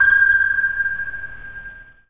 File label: Sub Ping